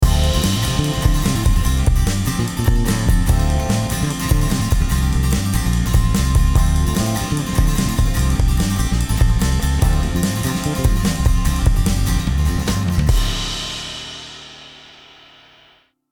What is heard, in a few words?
Chandler TG 1 Rhythmsec dry Tracks